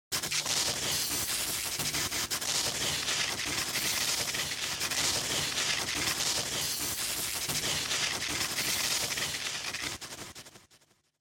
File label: Electric Shock Long